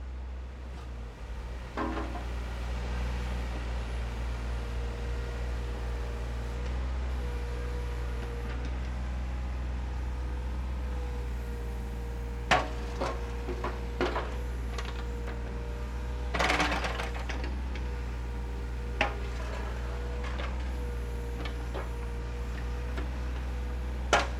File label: Ambience Construction Site; Just Ambience; soundbits